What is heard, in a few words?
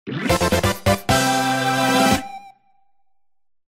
Mission Clear